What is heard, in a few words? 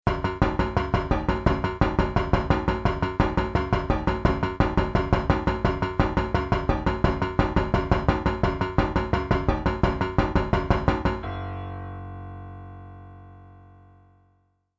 jingle